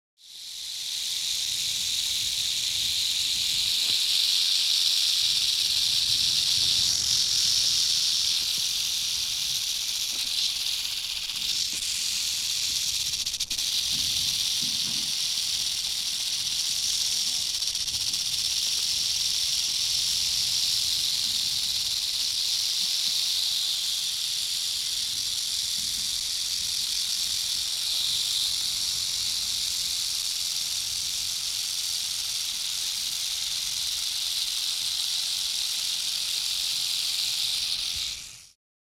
Rattlesnake Tail Rat

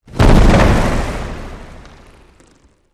Boat Impacts Water

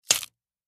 Snap Celery Sharp

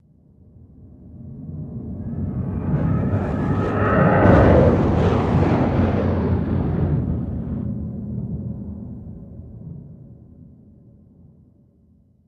Bird Prey By, spaceship fx

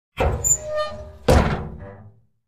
Cabinet Door Open

